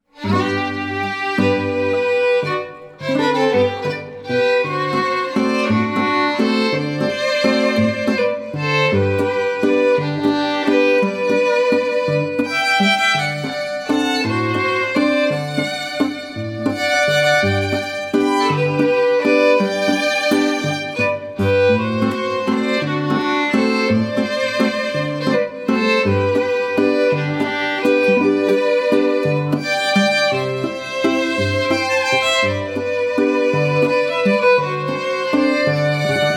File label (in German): Hochzeitswalzer; volksmusik